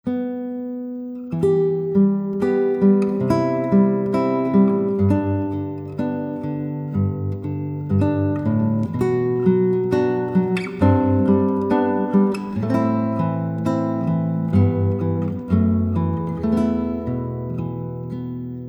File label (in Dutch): gitaarsolo